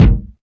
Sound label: good kick sample g key, Royality free kick drum single hit tuned to the G note